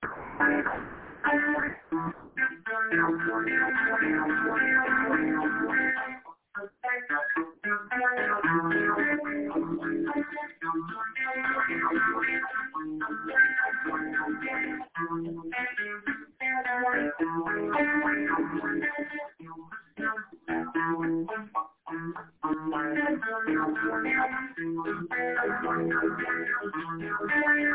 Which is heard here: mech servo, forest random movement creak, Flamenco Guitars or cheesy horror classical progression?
cheesy horror classical progression